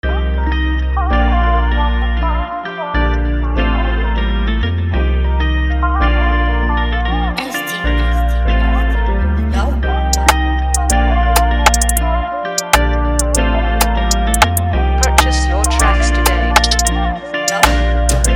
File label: FREEBEAT, type beat